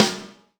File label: LW PIC RM, Drum Mashines, SR-16 DRUMS; EdgeSounds